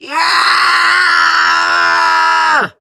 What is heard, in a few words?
Scream; Warframe; Grineer Catbrow Handler; Battle Barks